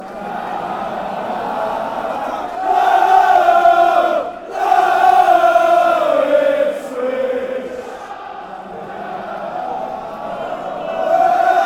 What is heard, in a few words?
na nanana ipswich, fanchants